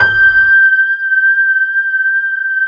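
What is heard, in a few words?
reverse piano G5; NEW AGE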